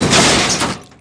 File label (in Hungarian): karambol; Pure Pinball